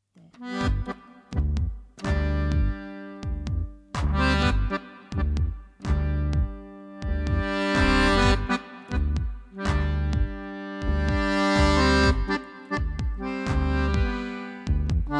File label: Tango